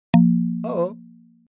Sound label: wrong